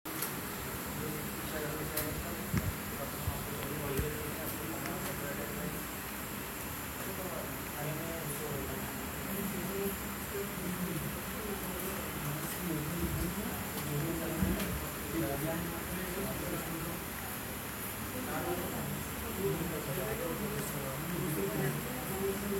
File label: karaoke recording